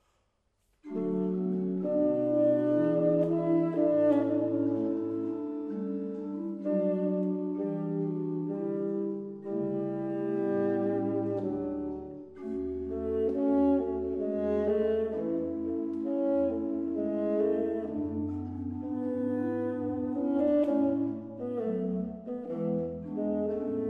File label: bassoon e b.c.; Bist du bei mir; BWV 508; Bach Arias from Cantatas